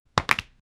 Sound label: soft stylized poker deck